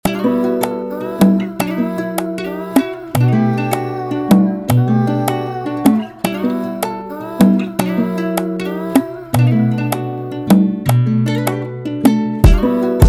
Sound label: free beat